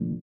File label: VIBRA; Spectrasonics; Metamorphosis